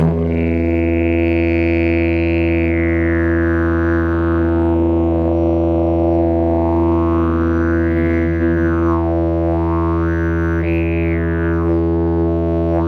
jilm koncertni, didgeridoo

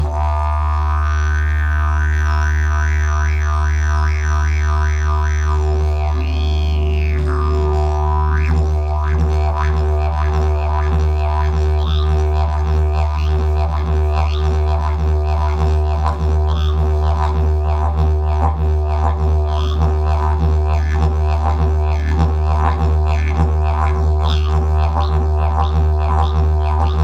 jasan fladrovy, didgeridoo

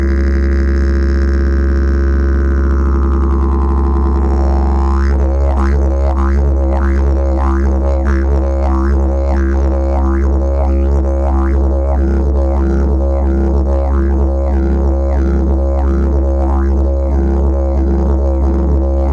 jilm, didgeridoo

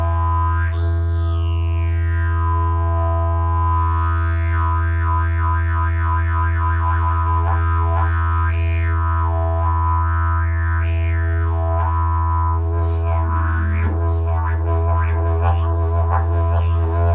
jasan, didgeridoo

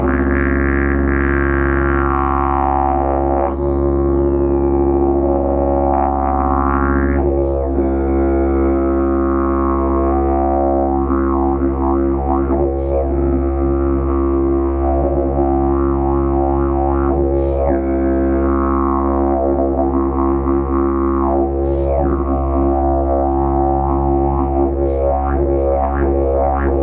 jasan roztrubozvuk, didgeridoo

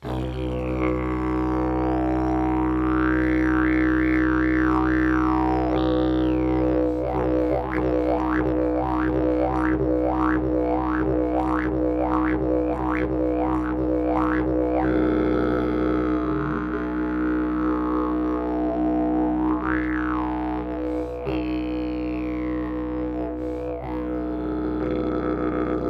jilm, didgeridoo

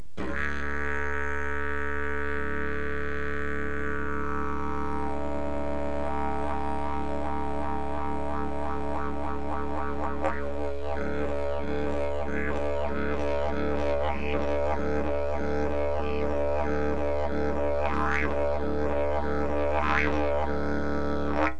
jasan fladrovy, didgeridoo

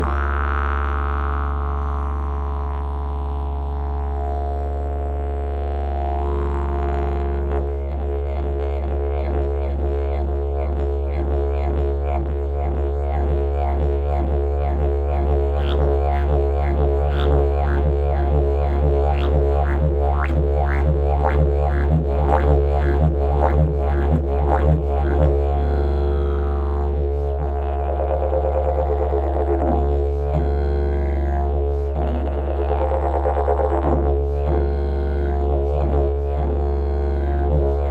jilm mocnozvuk, didgeridoo

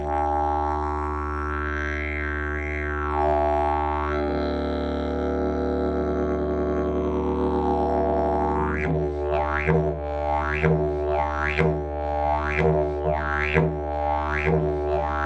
jilm zlutak, didgeridoo

